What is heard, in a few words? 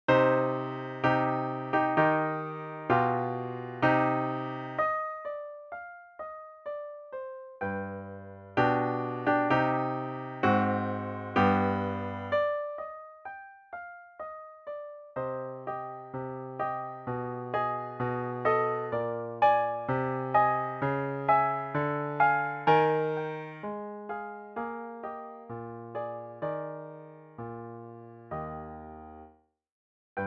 funeral march piano solo